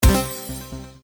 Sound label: stop bonus; All Ways Joker